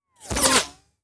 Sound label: zap fast; GoakeFlax; telezap